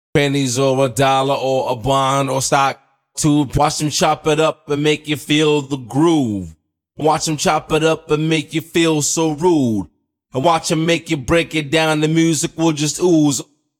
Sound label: Vocal Dry